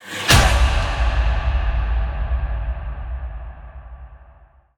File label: Cinematic Hit